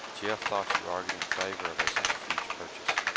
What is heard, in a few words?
noisy